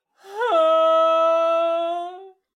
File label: moan